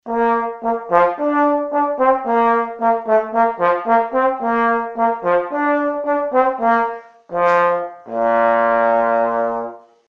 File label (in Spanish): Trombon